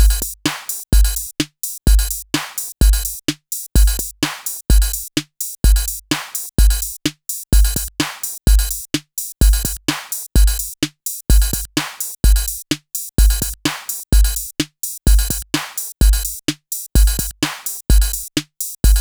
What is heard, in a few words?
Drum Samples Overdub Rythm FX